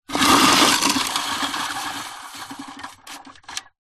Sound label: household washing line retractable pull extend plastic housing